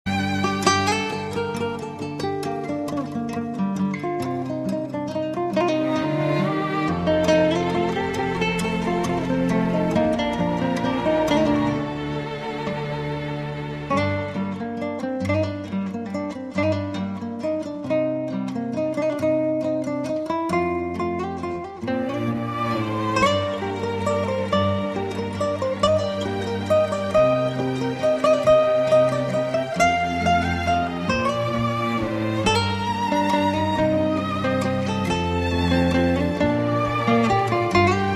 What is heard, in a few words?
guitar